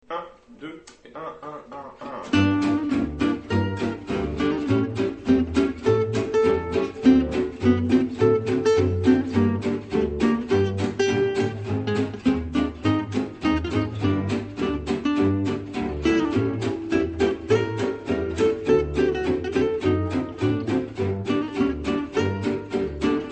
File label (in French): swing gitan